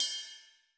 cymbell; beatroot

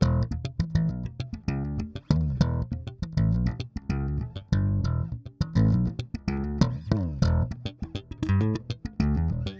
Electric Slap; dry

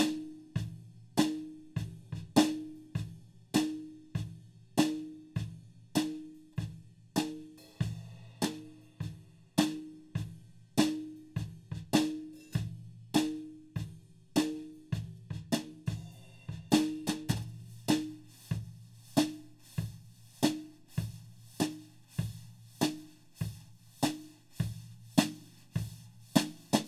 Snare

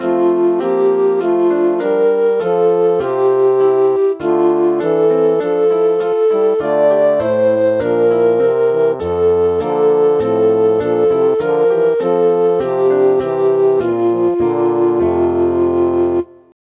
midi

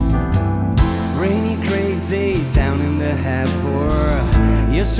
music 16kHz

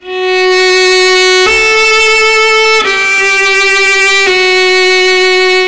Academy Violin fuzz

